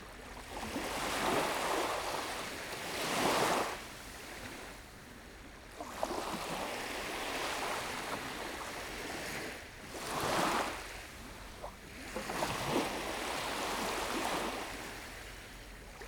cavaliere sable fin; 15s; KM140 Neumann

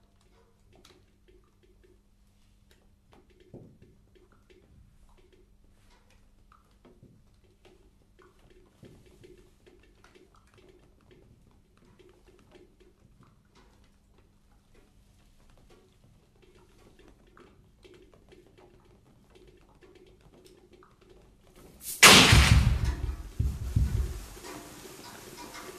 pluie doigts

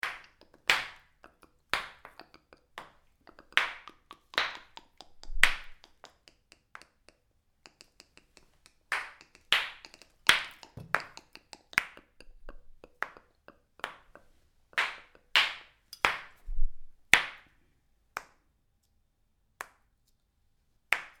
cailloux espace

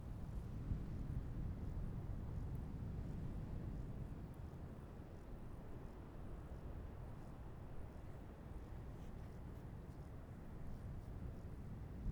mer bleu lointain; Une histoire de bleu

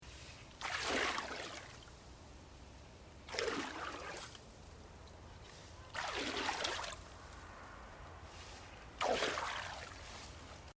feuille; ratiss ae

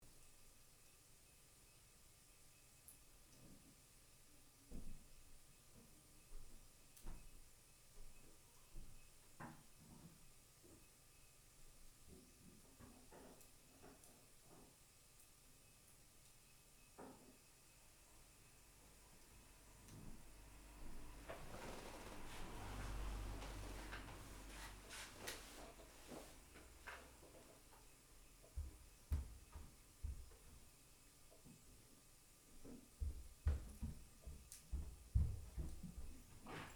pas plafond

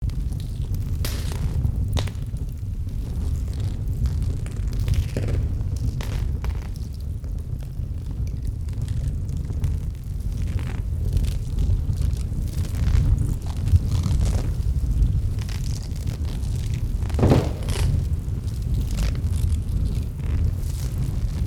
feu de Troie; L'iliade

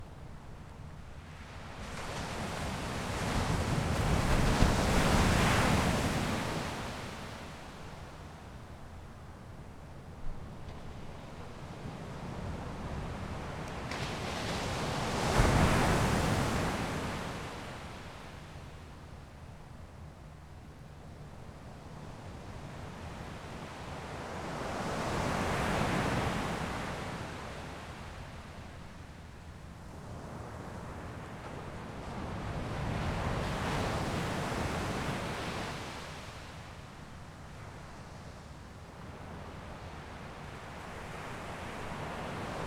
petit pont vagues prox

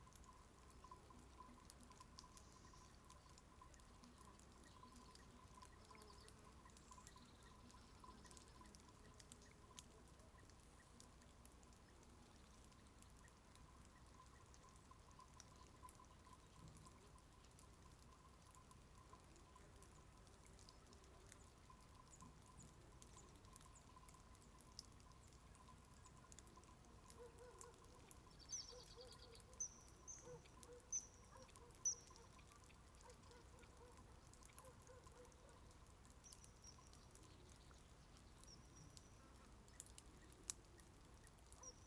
silence matin roseaux chevres stymphalia; L'iliade Philippe Brunet

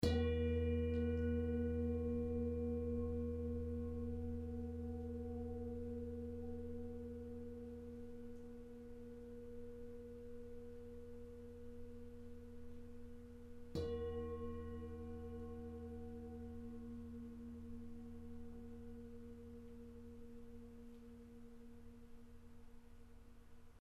Bol tibetin

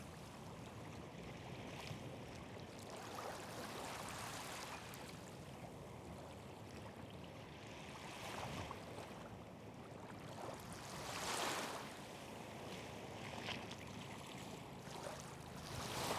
Madrague St Cyr; PSP2; La-Madrague-St-cyr; neumann KM140

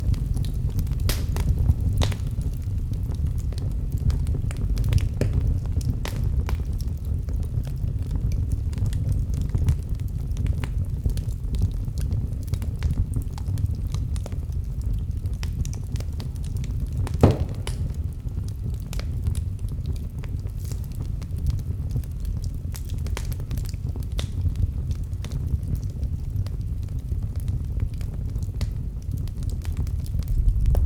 feu d cheminee violent; L'iliade Philippe Brunet

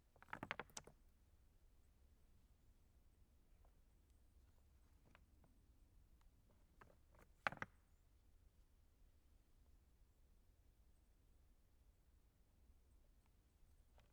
pierre silence

